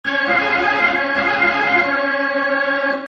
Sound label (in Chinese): 主场风琴上行; 战中; 战斗音效; nba